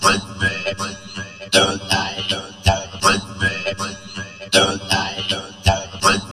VOCOD, Drum Loops Crazy Processed

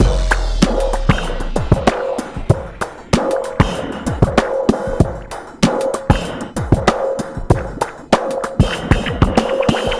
DRM 096, Breakbeat; Best Service ProSamples vol.24; AKAI

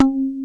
C-4 PICKED, METALLOID; AKAI S-Series CD-ROM Sound Library VOL-7

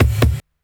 kick, Hardcore Breakbeat; Big Fish Audio; Hardcore Breakbeat Action; Rhythms 4 Life 151 Ebmin

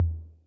Tom Low Accent Min Decay, 808

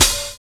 FAT DISCO; Univers Sons

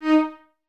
String Ensembles, Violas, VLS Detache

